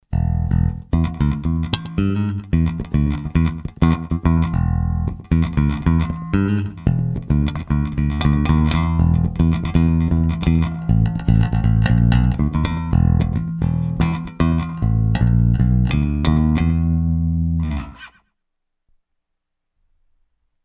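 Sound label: ENHANCED, Zoom 506 II bass effects factory preset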